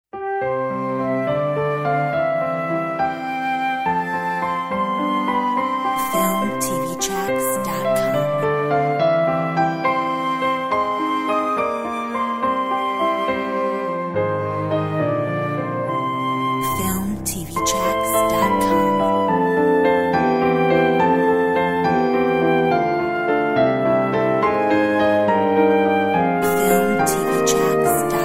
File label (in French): Valse